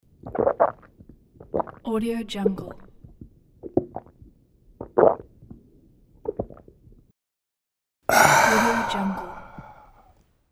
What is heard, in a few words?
drink